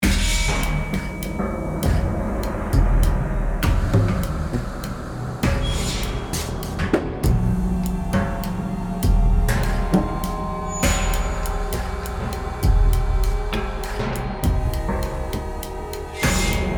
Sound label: Action (Loop)